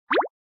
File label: character step